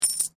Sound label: Coin Penny Multiple Drop Stone